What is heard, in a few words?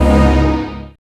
WARNING MOOD, Strings